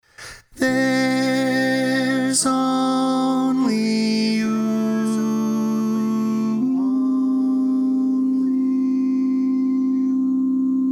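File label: Baritone, barbershop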